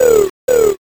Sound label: crow